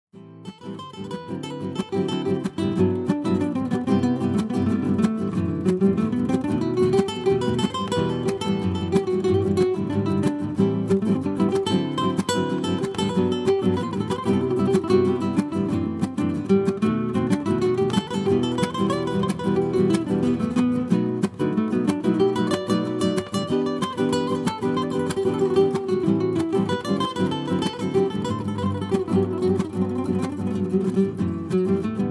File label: Guitar Samples; solo